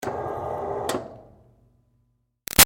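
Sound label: Projector Screen Close Up Up Short; Offices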